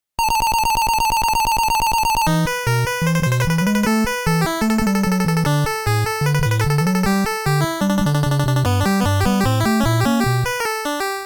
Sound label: Main BGM (Hurry Up); Game Sound Museum Namcot; Mappy; Mappy Land; Mappy Kids